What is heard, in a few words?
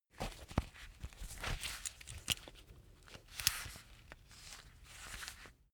leather belt close medium